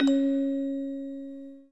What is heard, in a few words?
orgel